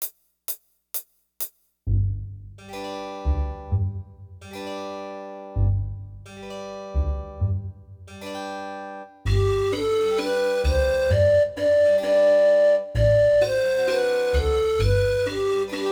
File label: up the scale